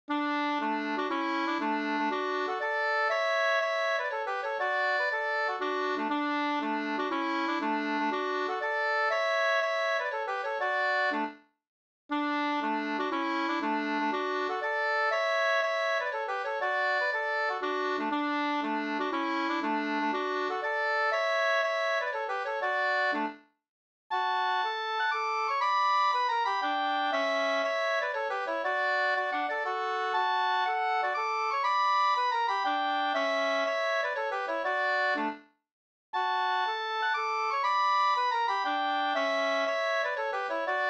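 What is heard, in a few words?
polska, two-part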